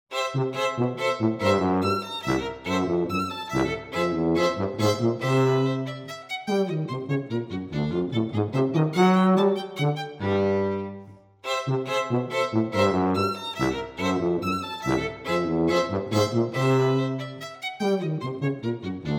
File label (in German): Unterkagner Ländler für Violine und Tuba, Allegro